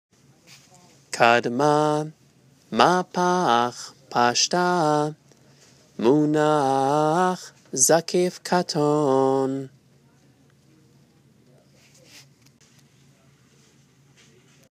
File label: katon torah, trope; Trope Families